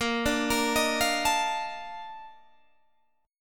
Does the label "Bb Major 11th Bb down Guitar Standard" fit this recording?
Yes